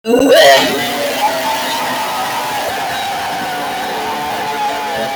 Muted Goofy Screaming

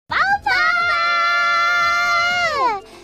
Bau Bau

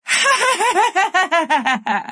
Punk Laugh

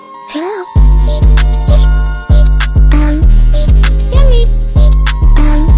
Hello Umm Beat

